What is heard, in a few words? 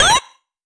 Jump, 8-Bit; 8-Bit Kit Volume1